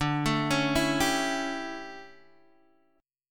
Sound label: D Major 7th Suspended 2nd Suspended 4th D, Guitar, Open G